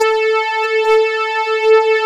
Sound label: string; Techno Trance Essentials